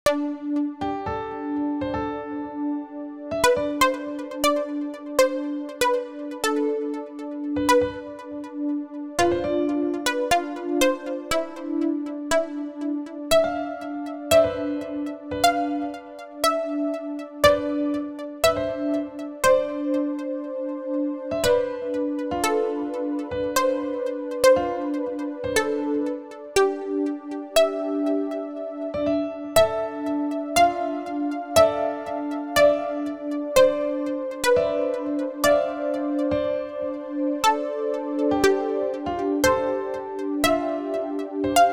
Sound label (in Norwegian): portalen5 + ødelagt piano; fossekleiva-ks-hackathon-demo